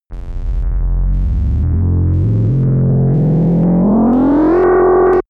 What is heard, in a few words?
starship warp